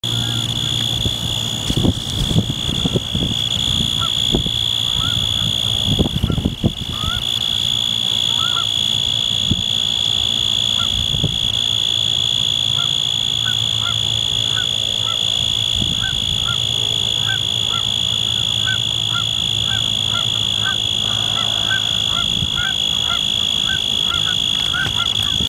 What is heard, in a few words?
Forbes' Tree Cricket, Oecanthus forbesi; Crickets and Katydids